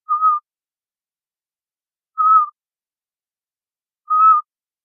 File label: bearded, owl